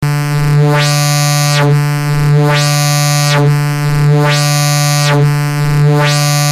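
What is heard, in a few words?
Filter Sweep In; Eurorack; PitchShaper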